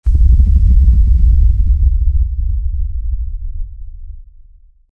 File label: deep bassy shockwave from; waves